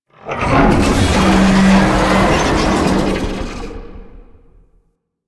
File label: Lasrian Tank Infested Vocal Idle; Enemies; Barks; Warframe